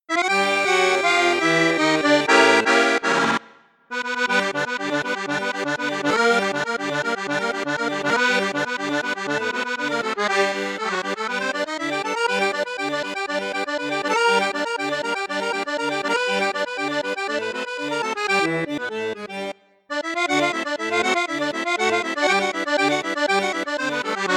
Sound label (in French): registre basson flute basse flute juste flute haute, accordeon; piermaria 324